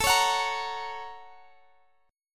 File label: F sharp Major A; strummed; JGuitar